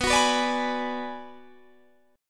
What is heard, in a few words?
Gsharp Minor 7th Flat 5th